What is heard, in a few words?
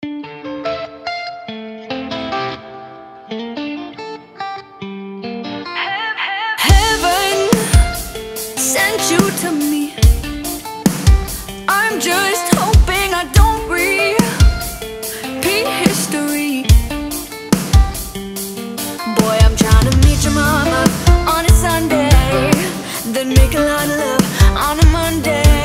(Rock Cover)